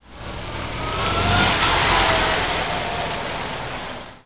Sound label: driving, vw; Driving #1